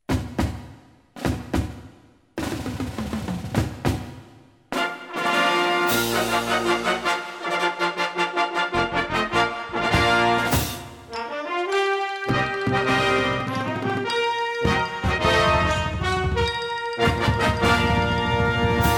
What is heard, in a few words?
Fanfare; Symphonic & Concert Band